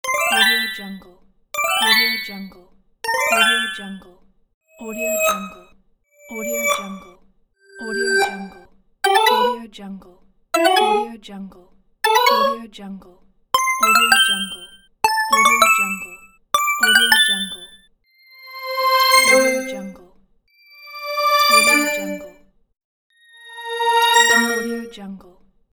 interface sounds

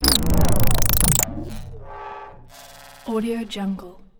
vocalizing glitchy bass; soundmorph

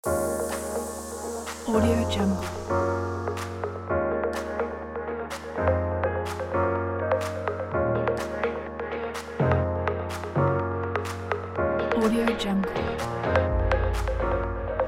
energetic deep house

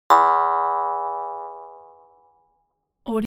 cartoon percussive mouth harp

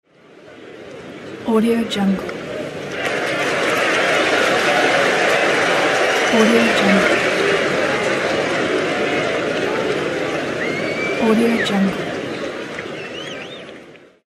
crowd cheers

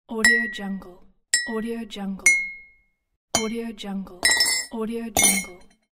tea cup; archisound

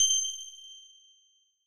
new message cling

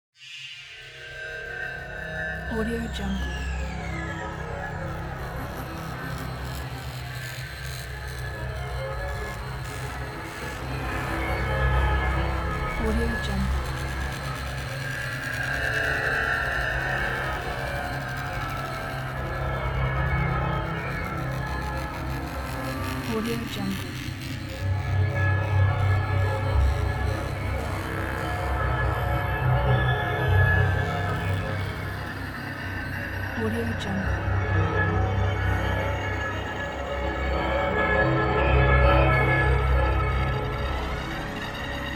dark ambient soundscape

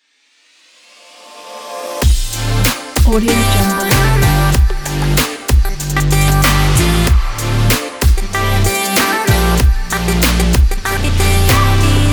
modern energetic future bass

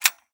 observations binoculars button; blast drive 30; prosoundfx

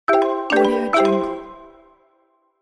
win game level marimba

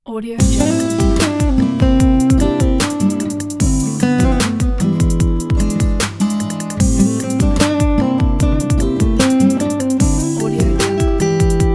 hip hop blues

